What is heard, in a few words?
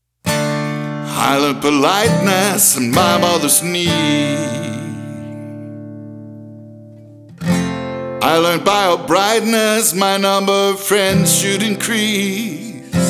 Laugh